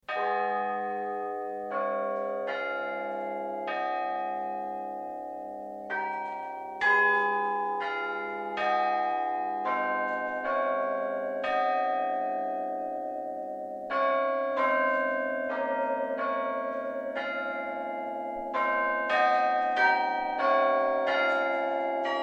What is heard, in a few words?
cycle for carillon Solitery Bells; poetry impression by Jonas Mekas